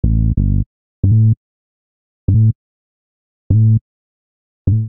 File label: A Minor 85bpm, Bassline; MIDI Clips; Beat That; Ableton; Factory Packs; Chop and Swing